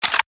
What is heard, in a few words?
bolt pull; tacz; daffas arsenal